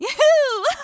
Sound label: "yahoo"; pauline; SM64 CO-OP